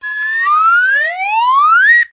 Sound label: Backslide Whistle Ringtone